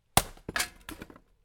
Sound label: Wood Chop